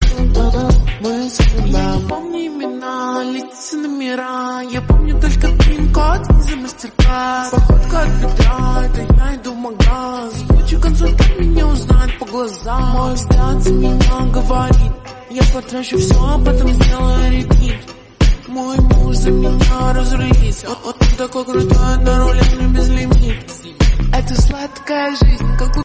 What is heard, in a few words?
speed up version russian song